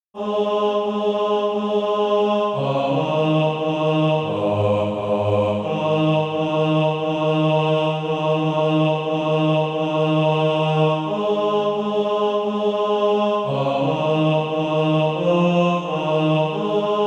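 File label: bass, SATB Practise Music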